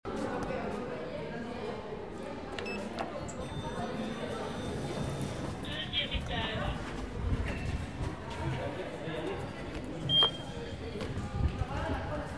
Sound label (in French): ASCENSEUR 2EME ETAGE